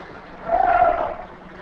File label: Scream; KING HOUSE SCREAM - “NO”; VOICE ISOLATED